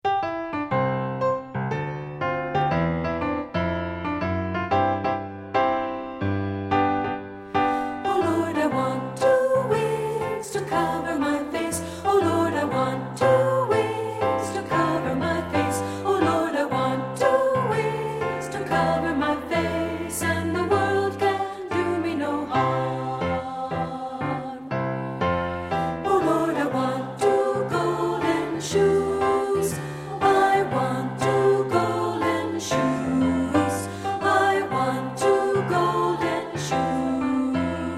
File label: ALTO